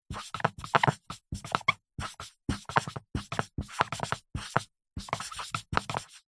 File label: office whiteboard pen writing hard